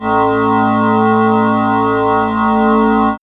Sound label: SWIRL; X-Static Goldmine 1